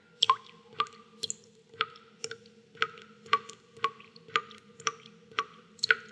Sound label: drop water; EnvSound